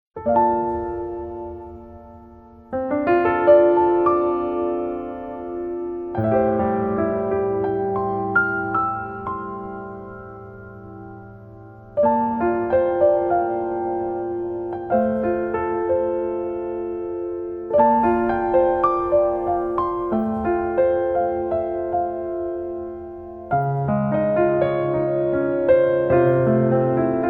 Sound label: BGM Training Room